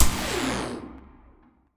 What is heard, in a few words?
hit; Electricity Game Sound Pack